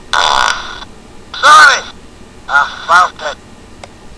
farted; Austin Powers

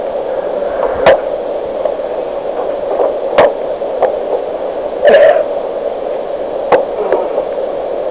moan

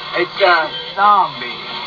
zombie

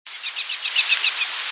robin

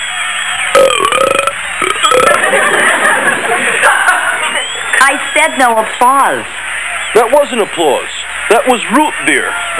burp; alfsound

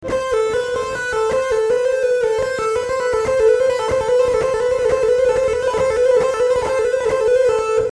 legato

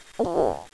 fart

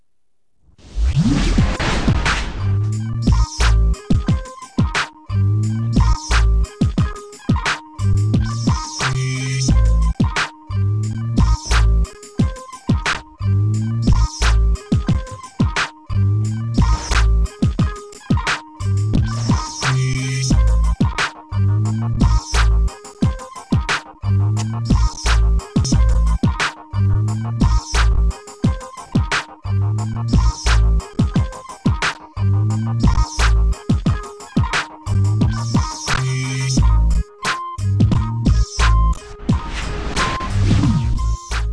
music

